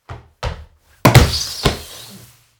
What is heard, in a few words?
foley body falls foley various body fall jump; سقوط از پله و برخورد; human sounds